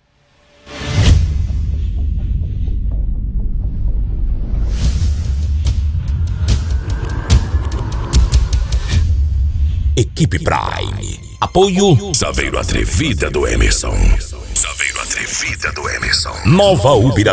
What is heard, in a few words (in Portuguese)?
moda de viola; frequency mix